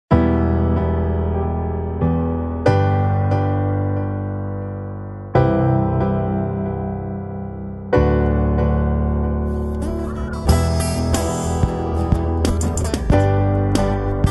music Remorseful 92

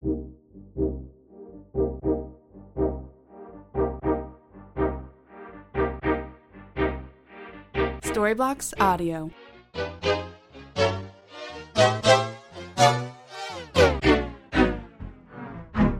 Beach Day Chords 120 C

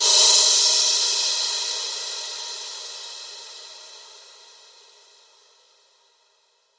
Crash 120; Beach Day

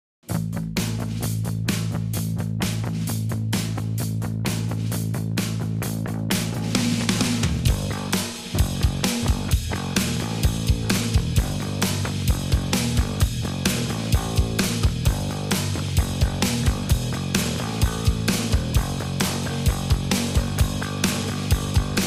drums bass; get rock solid

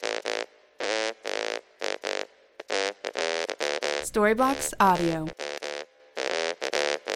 Ambient House Trumpet 134BPM A

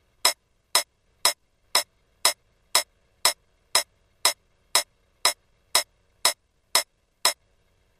Ambient House Closed hats 120BPM

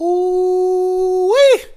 Rap Vox

